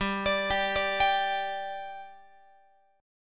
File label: G 5th G, Guitar; G5 arpeggiated